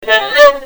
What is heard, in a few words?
cartoon; funny